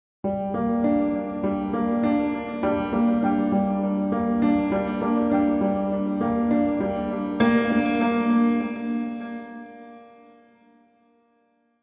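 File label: Syncopated Eighths Arp; ASCEND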